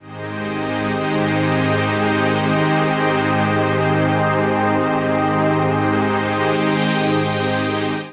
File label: Pad (G#) 118bpm; Music Loops; Gracious Fields; Ableton; Singularities